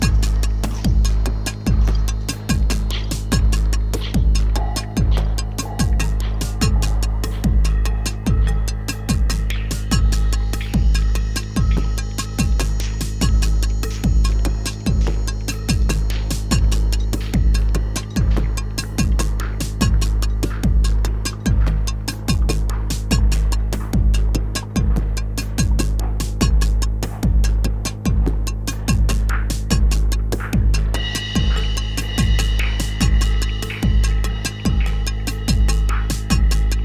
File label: tribal